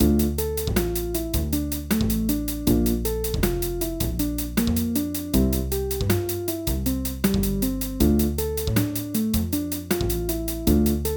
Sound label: 3 vs. 2 backing